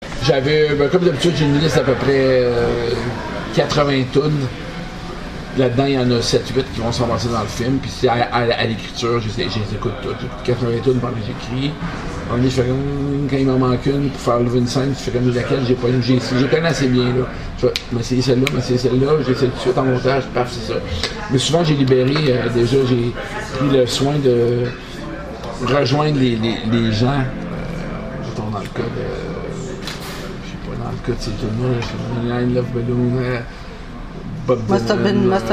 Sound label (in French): musique